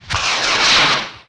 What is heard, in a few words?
MISSILE; Falcon 40